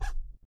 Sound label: acoustic bass F-noise; Masterbits CLIMAX 7 - Bass